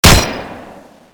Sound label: gatling shoot